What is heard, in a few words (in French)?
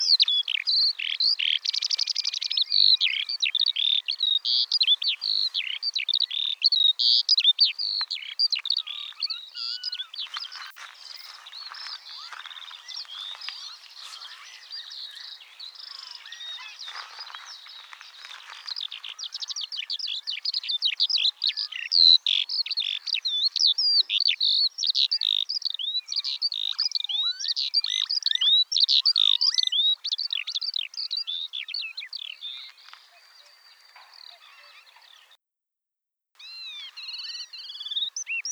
Alouette des champs Alauda arvensis